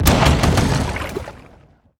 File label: Gunboat death